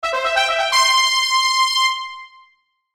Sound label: Musical Arpeggio Sharp; Miscellaneous Musical; Blastwave